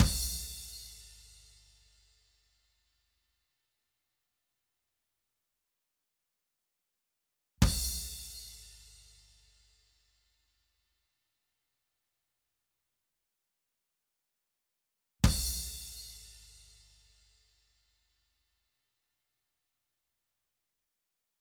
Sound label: Drums, Intro Tom Stabs; Remix Part